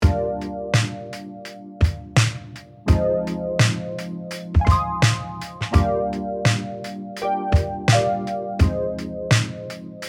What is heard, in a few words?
untitled hip hop